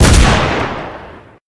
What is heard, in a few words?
spas12; Zombies Night